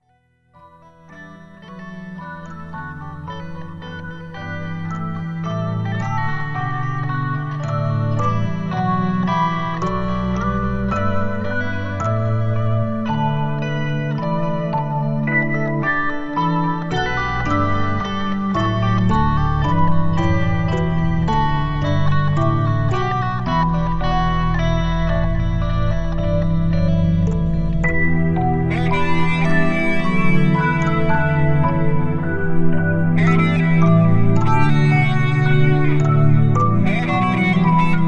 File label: Drop